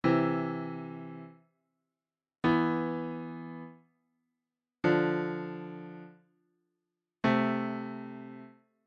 Intervalos Consonantes Imperfeitos

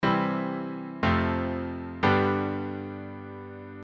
Acordes CFG

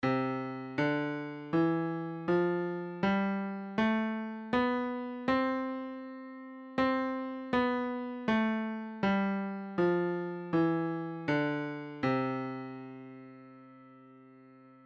Escala Diatonica

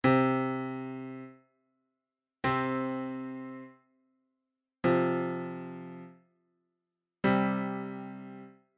Intervalos Consonantes Perfeitos

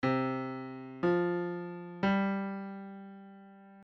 Notas C F G

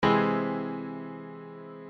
Acorde Aumentado

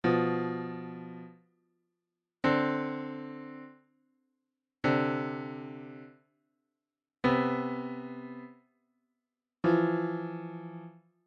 Intervalos Dissonantes Neutro Suave e Forte

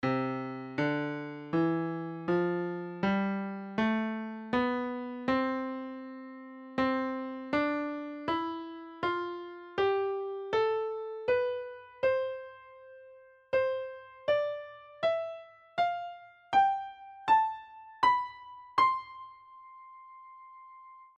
Escala Ascendente

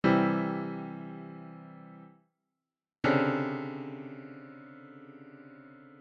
triade vs random

